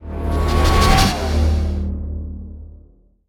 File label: arcane activate; smite; god merlin